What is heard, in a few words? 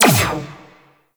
Laser Fire; Enemies; Warframe; Vomvalyst; Teralyst; DE